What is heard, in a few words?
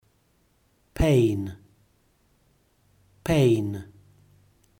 pain